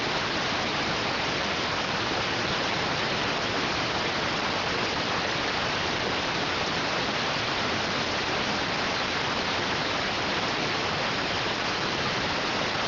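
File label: radioteletype receive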